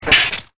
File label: TIMER